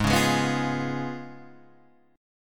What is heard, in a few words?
Db 7th Ab, guitar